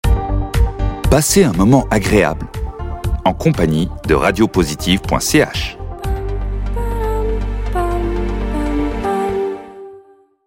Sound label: jingle music